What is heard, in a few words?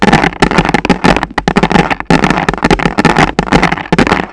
Heavy Hail, Aircraft A320 321